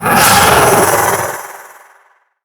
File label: Vocal Attack; Warframe; The New War; Archon; Nira